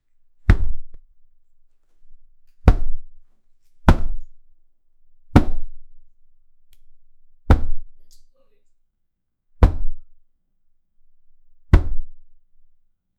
running away i hit my, accident

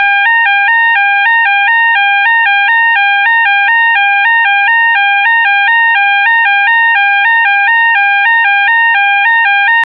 phone alarm

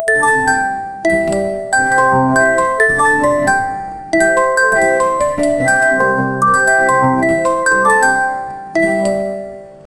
slot casino game classic, slot machine

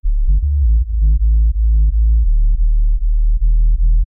a rotating bass in the; synthesized

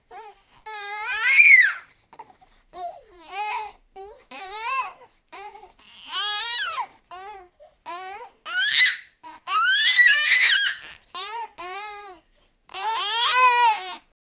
6 month old baby laughi

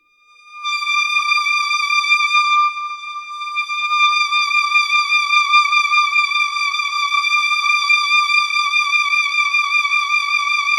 high pitched violin scree; aggressive

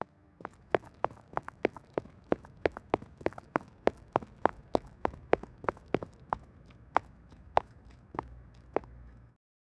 footsteps concrete jogging

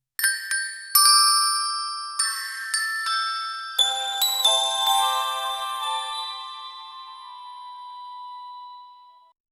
kids friendly soft magic

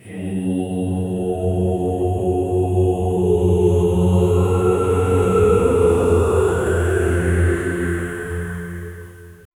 a dark rituel chants in

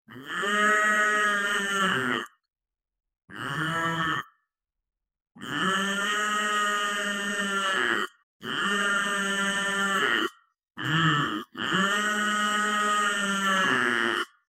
the sound of a cow whale, marine life

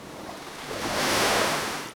ocean

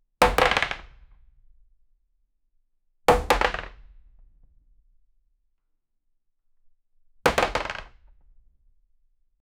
single drop of two large, wooden

